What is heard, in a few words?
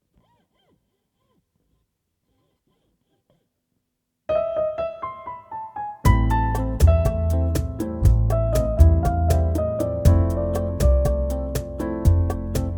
Latin Quarter